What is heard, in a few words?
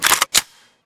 m60 charge; mg; garrysmod